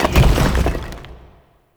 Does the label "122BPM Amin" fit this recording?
No